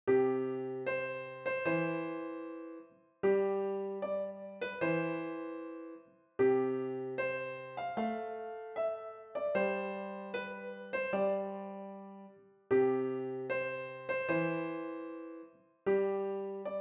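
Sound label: piano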